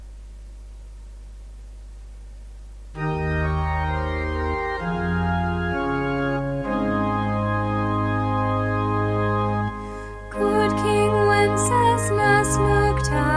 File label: Good King Wenceslas soprano, choir